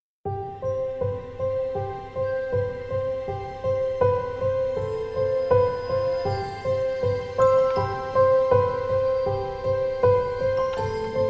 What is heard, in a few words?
(Acoustic)